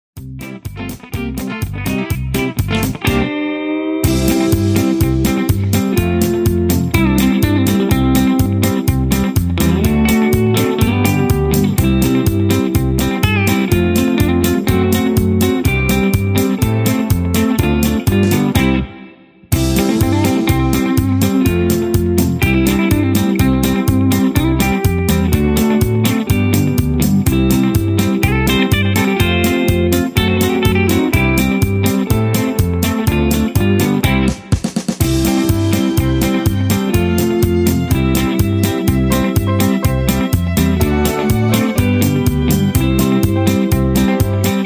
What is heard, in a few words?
instrumental No Leads